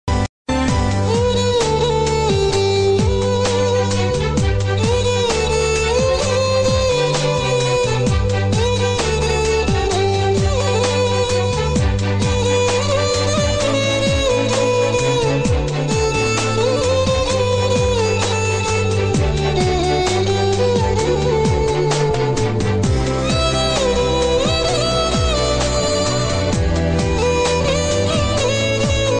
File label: sad bgm ringtone; Mungaru Male movie